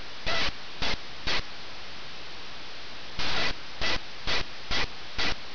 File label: YELP, bird; Game birds and what islands you find them on